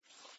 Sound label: slide